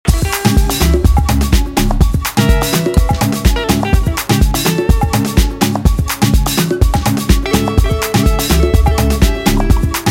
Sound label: guitar break